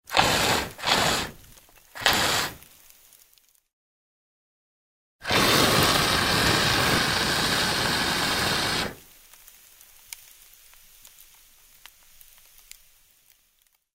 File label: Fire extinguisher